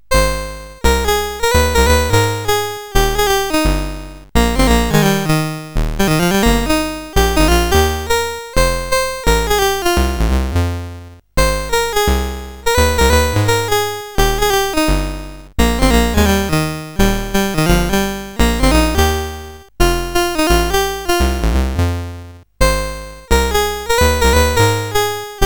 sid CSG8580R5; Mondus Fight Palace

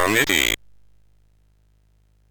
sid MOS6581R2

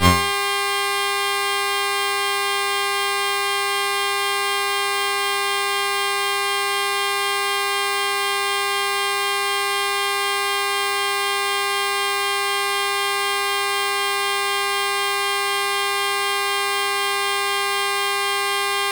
sid CSG8580R5; Bird Mother

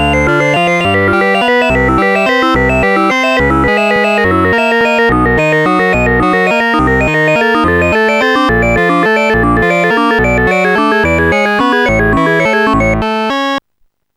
jazz five; Instant Music

